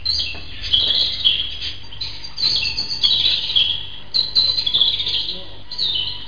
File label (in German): vogel